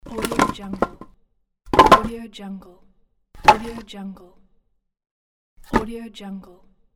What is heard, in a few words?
logs falling